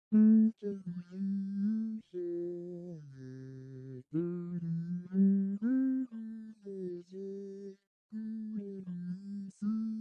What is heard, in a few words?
Guitar